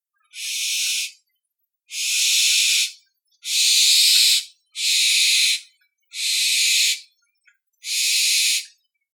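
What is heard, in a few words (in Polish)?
płomykówka głos piskląt; ptaki glosy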